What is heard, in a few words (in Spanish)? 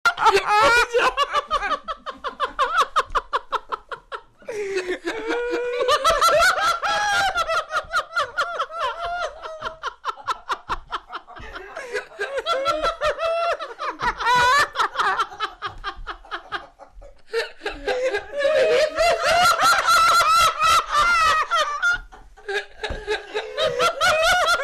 risa payasa